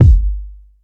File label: hip hop kick sound F# key; kick drum; F sharp; kick drum samples